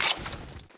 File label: draw knife; weapons